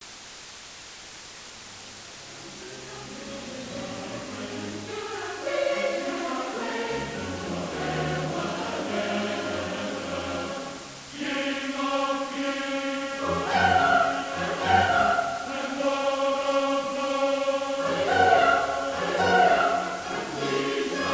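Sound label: minus60 reamplified, In this track the -60dB attenuated music signal in the previous track has been re-amplified 60dB; to return it to a normalised listening level.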